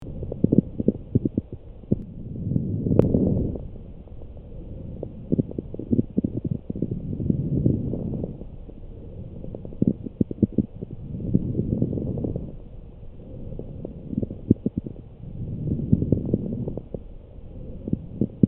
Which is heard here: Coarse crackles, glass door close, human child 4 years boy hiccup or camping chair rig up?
Coarse crackles